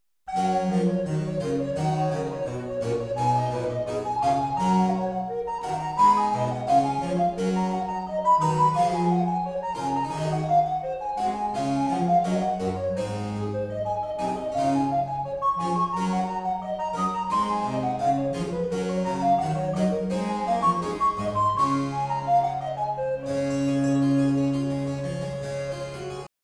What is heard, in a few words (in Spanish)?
Sonido de flauta dulce; Instrumentos de viento; Viento-madera; flautas